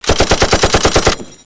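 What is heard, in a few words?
machine gun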